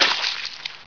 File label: squish